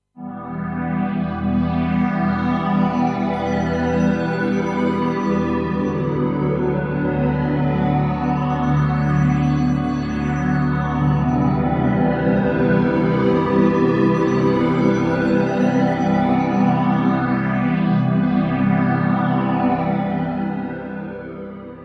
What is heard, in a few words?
Pad, Flanger, Chorus, Delay, Reverb